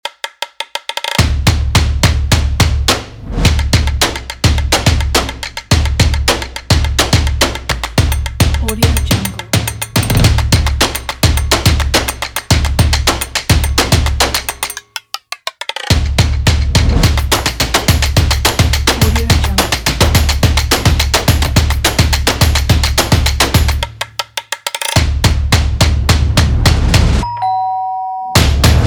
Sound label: stomp kit